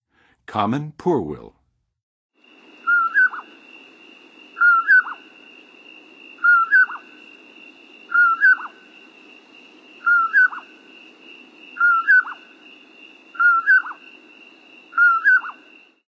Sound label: Common Poorwill, Songs of Birds; North America; Western USA; Western USA Songbirds